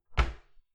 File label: cupboard close dishes ringing